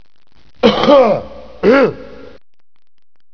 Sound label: cough; PSL Monthly Shareware CD-ROM (November 1993)